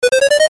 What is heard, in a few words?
arcade game tone